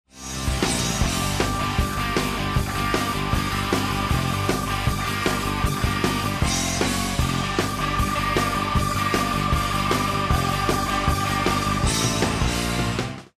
start action; music kits custom

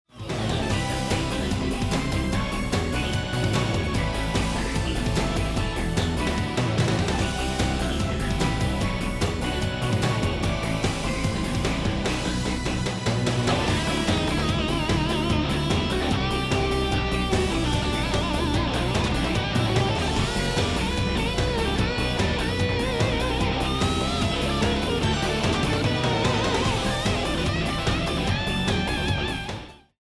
bomb planted; music kits custom

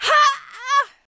death

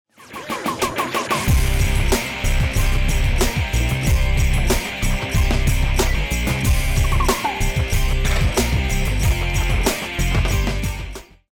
start action; music kits custom

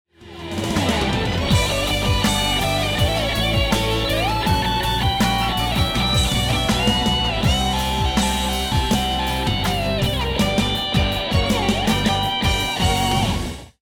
choose team; music kits custom

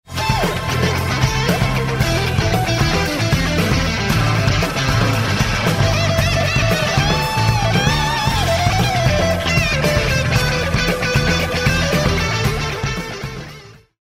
choose team

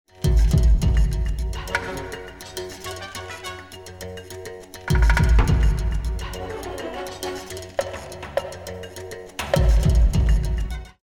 bomb ten sec count; music kits custom

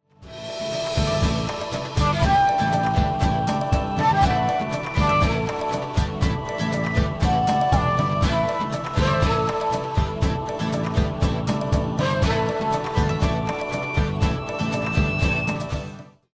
start action; music kits custom

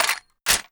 awp clip in; weapons; snip awp; bornbeast

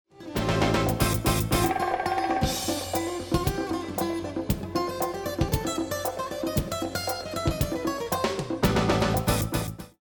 round ten sec count; music kits custom

